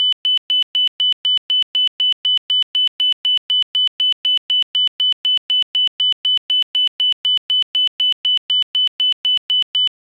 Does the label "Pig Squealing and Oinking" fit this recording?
No